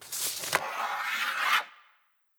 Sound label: final photo drop